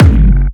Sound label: steel kick drum G key